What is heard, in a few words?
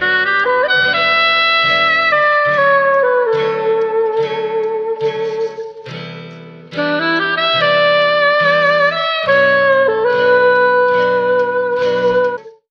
sax and guitar strums, Loops; Phonky